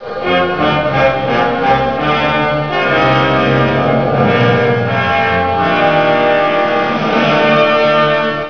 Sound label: raid fanfare